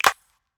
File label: clap; eddyflux